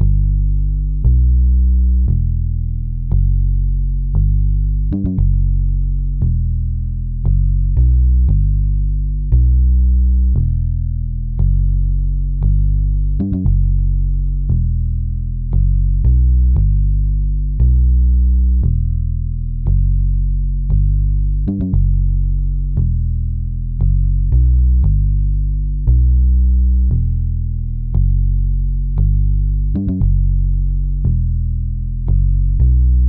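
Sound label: Bass Loop B minor 116 BPM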